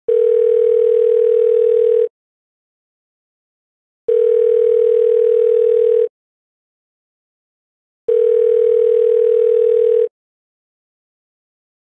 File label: phone call sound effect